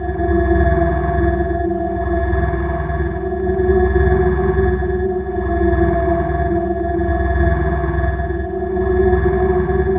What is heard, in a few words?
Door Lock